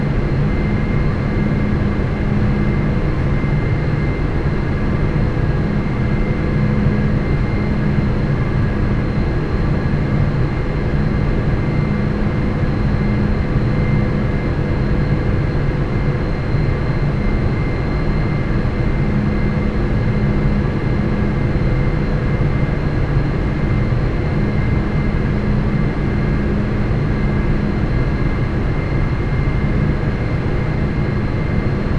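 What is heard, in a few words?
Ambience Pipehouse; Pilot Down Behind Enemy Lines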